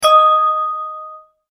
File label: multimedia tone correct